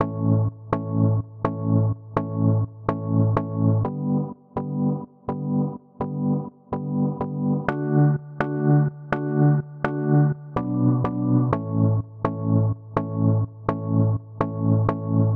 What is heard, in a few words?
organ pulse 125bpm; lolicore